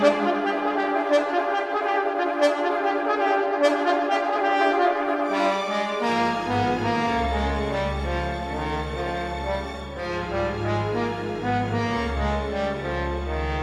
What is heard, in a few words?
concerto for trombone and orchestra